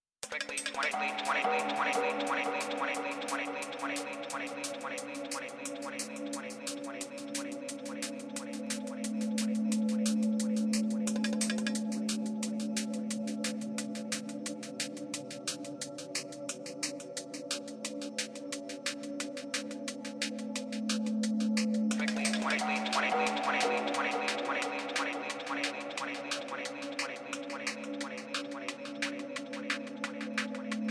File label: TRAP